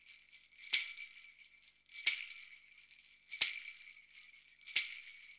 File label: 089 FREES, Modern Percussion Loops